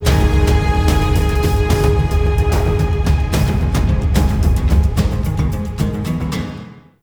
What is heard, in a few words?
Mounted Intro Music; Dax; Warframe; Duviri